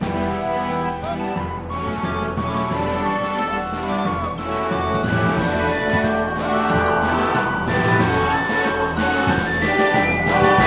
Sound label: find lost city; Sid Meiers Pirates; XBOX